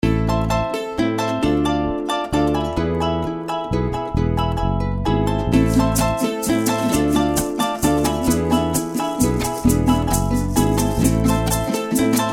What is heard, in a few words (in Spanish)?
Pasaje Llanero